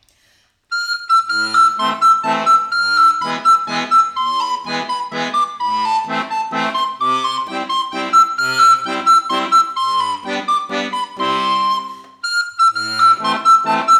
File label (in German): Walzer Stilkopie